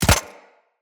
Arachnoid Micro Land; Enemies; Corpus

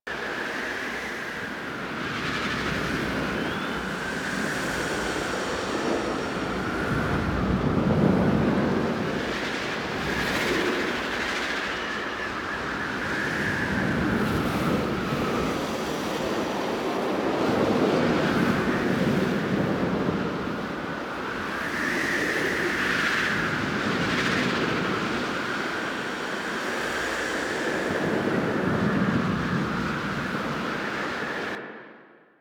Gas City Large Exterior Wind Amb; Warframe; Corpus

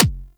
Weapon Punch Pistol; Warframe; Weapons; Pistols

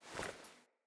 Foley Walk Default, Clothing; Player

